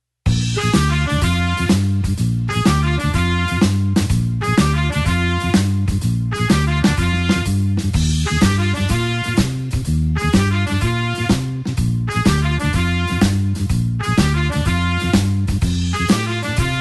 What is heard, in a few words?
blues horns shuffle Bb